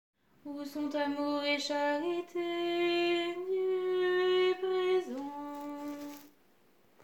alto

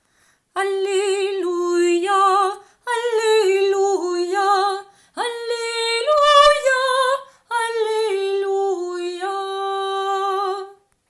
Acclamation de l'Evangile; Introduction

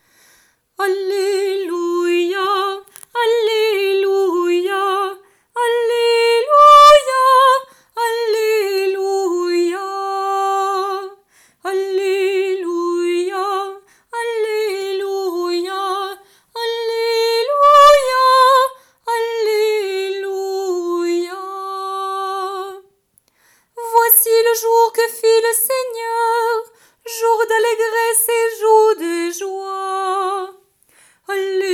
Liturgie Parole Alleluia

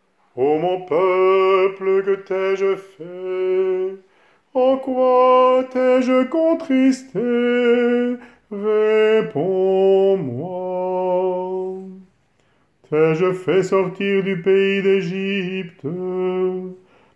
hagios couplets

